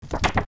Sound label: photograph